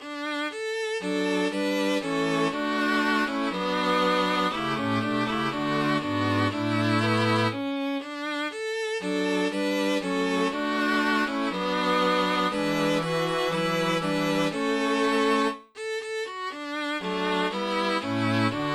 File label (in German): Orchester Version